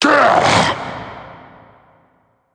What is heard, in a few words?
meph attack; slender